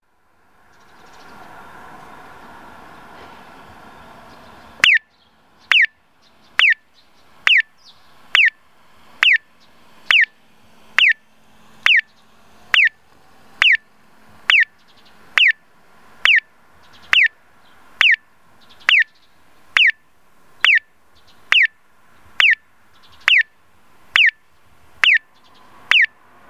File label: nagoya piyo, traffic signal, post office; M-MA-1; hannan